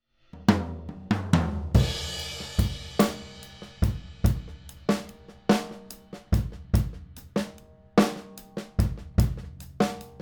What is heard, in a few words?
drum buss avec omega